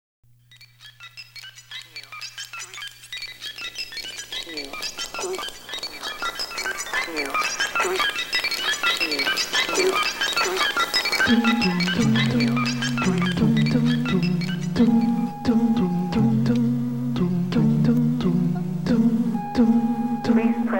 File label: Music Box